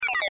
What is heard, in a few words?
Video Game Beeps; Vintage Beep Chime; Games; Technology; Blastwave